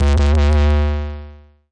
nasty stormfront, Electro FX

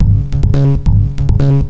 140 hexbeat, DRUM LOOPS and BREAKS

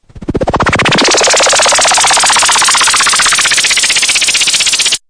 noise MiniBrute, Noise Sweeps

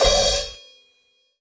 crash choke high, DRUMS (SINGLE HITS), Crashes

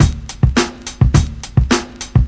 105 wound up hop, drum loops; 101 to 110 bpm

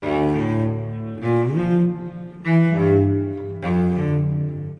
shadow cello; STRINGS ORCHESTRAL CLASSICAL LOOPS

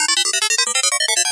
180 frenetic synth climber

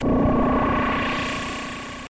c64 sid weird, Commodore 64 8bit SID Drums; LO FI and 8 BIT KITS

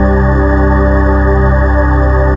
heaven pad; Pads

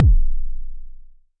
Jomox MBase, drum machine; Jomox MBase 01

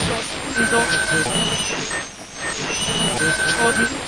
Radio Noise, radio tuning noises

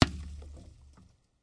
snowball glass, Throwing Snowballs; Throwing Snowballs Kit

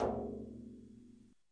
hirado, Japanese Drums; ETHNIC and WORLD PERCUSSION

